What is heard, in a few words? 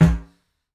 Didgeridoo Kit Samples